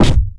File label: PYRO STOP; forsaken